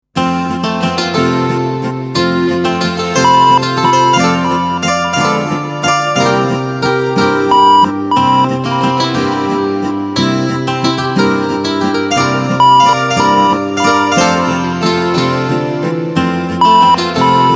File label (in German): Zither Walzer 180 3 4